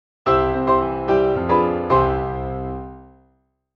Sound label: dur moll